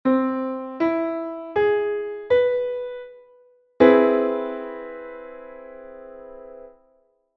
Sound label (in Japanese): 和音, CΔ7♯5